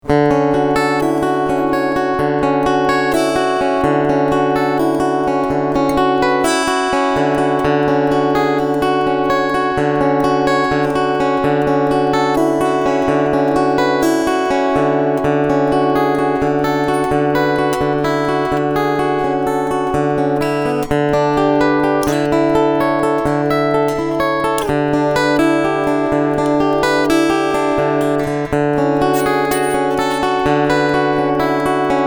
Picking LINE

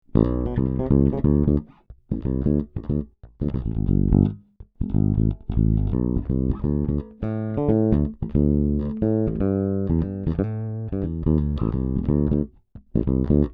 Savannah Asat Bass Fingerstyle

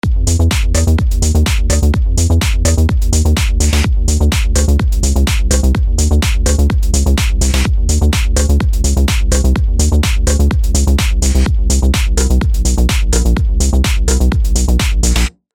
Avenger Tech House

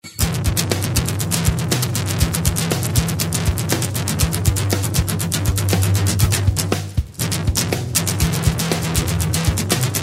distorted

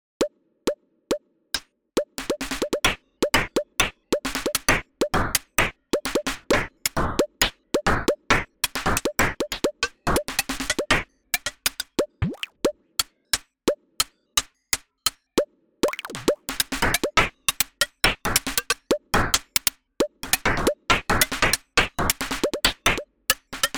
Sensory Percussion Plip Plop; Sunhouse

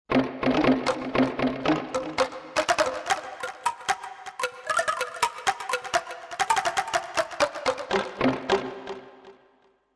Reason 10 Pangea Angklung in a Cave

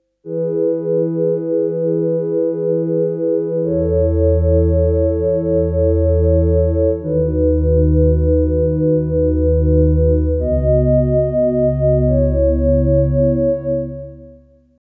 Church

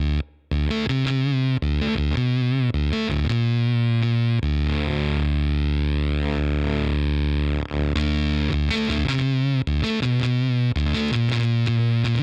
Source Audio Ultrawave SA 250

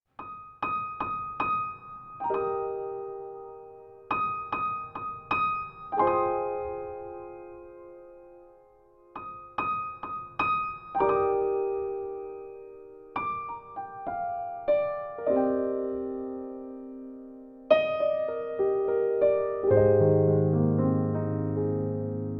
Nord Piano 4 Royal Grand 3D

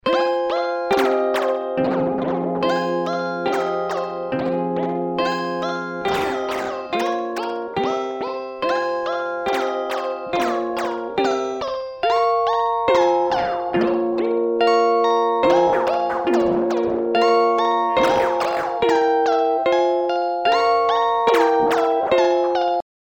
Meris Hedra

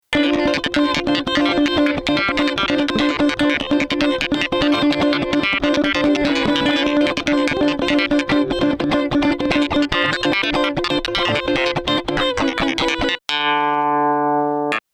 Tierra Audio Gravity VCA section atk fast; rel 3

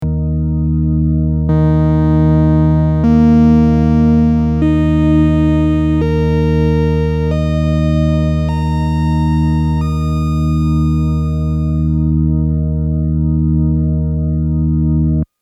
VCO Tracking

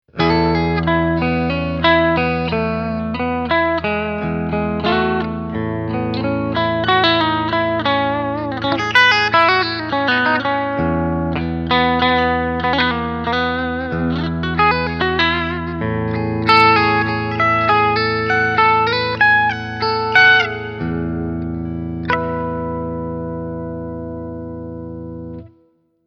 gretsch g5623 cb

